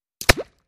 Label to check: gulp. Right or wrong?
Wrong